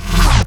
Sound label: Misc Short FX